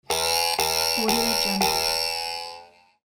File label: cartoon bouncing